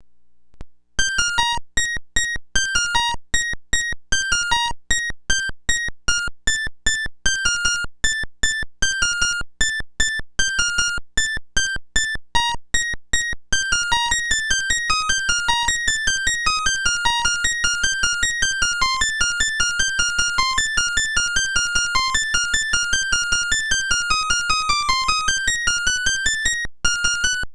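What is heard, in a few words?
PIANO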